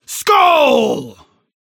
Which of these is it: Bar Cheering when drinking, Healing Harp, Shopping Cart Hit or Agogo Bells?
Bar Cheering when drinking